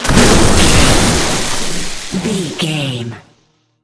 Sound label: electric lightning blast